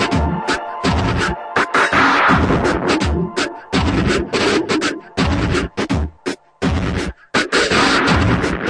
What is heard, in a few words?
083 bpm likes it noizy, drum loops and breaks